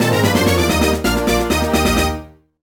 Jingle; Dig Dug: Digging Strike

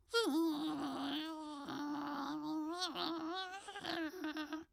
Balloon Idle; Zote

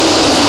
Sfx Winter games

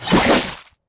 Food Cuting; Knight Online

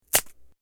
wood crack

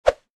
Toss; The Henry Stickmin Collection

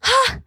Stun

